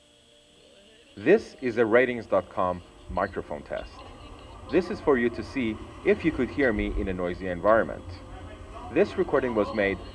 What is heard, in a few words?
subway; dac